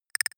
noise click metal; maschinen; signale; soundpool

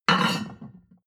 plate on plate place slide hard

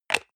hygiene small cup close hard, badezimmer

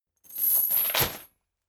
boxing punching bag metal chain fall down; boxen

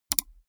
gaming mouse left click single fast soft

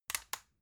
magnetic plug; objekte

